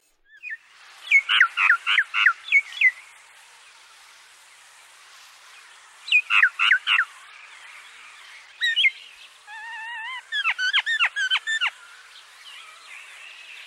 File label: Indian Myna